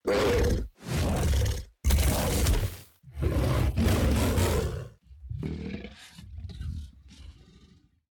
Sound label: Iguanodon sound